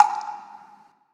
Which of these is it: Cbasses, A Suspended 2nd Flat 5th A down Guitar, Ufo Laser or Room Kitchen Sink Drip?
Room Kitchen Sink Drip